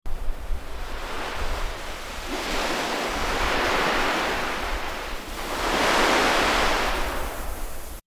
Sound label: Ocean waves gently; 波の音; ElevenLabs